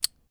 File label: Unlock Mode; Samsung Galaxy M31